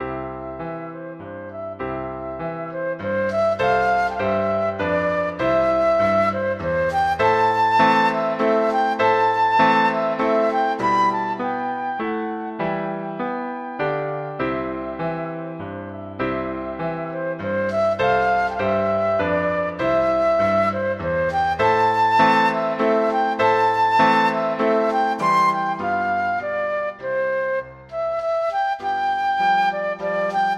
waltz, flute

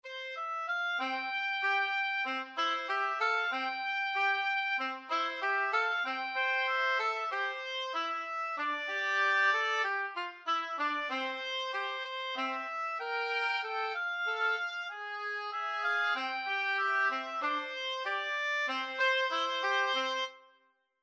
when the saints, oboe duet

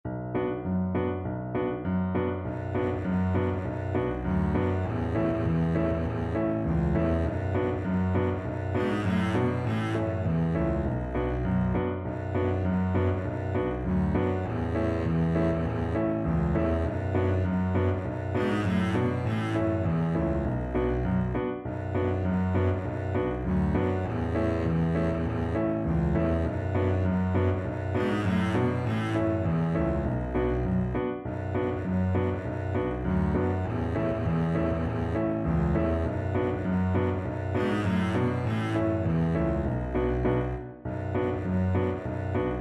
drunken sailor, Double Bass

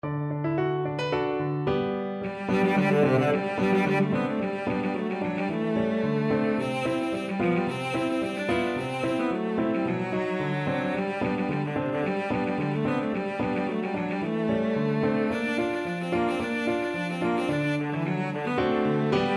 soldiers chorus, cello

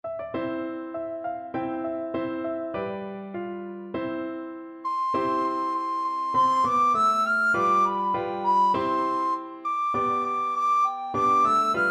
recorder

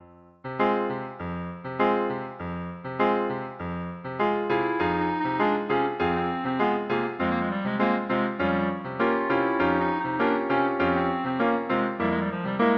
habanera, clarinet; bizet

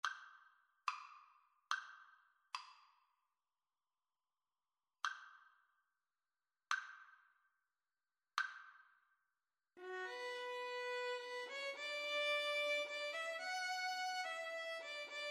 violin duet

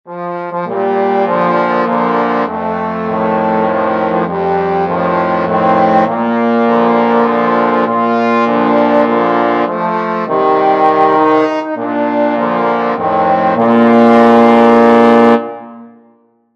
happy birthday, trombone quartet

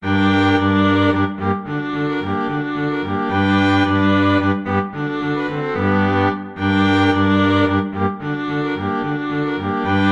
slavonic, string quartet; dvorak

